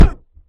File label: get Damage